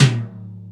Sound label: Toms, Real